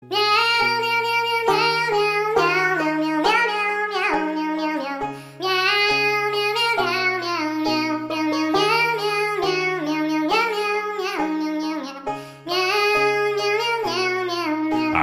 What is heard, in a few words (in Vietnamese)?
Nhac chuong hat meo meo meo meo